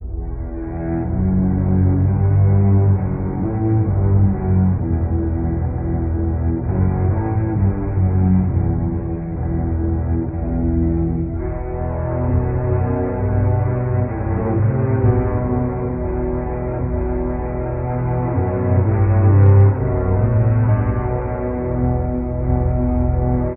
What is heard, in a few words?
strings, Sad Loops